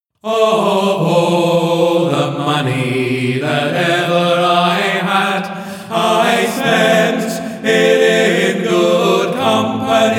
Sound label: The Parting Glass practice no piano